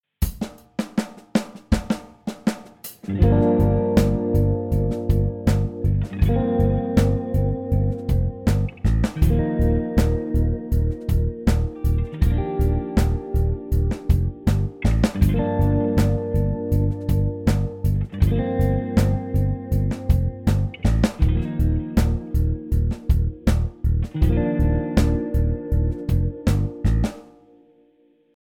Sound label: lick backing